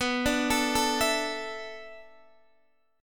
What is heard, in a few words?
B Minor 11th B down Guitar Open D